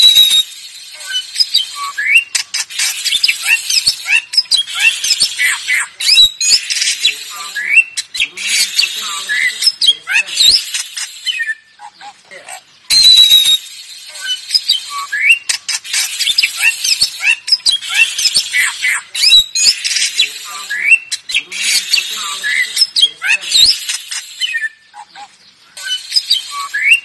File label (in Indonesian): Suara Burung Jalak Bali Gacor